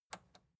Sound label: record player power release on